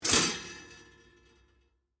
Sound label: Toaster Down, Household, Toaster, Down; Appliance Sound Effects; SoundEffects-BlastWave